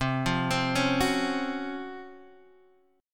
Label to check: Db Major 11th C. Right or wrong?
Right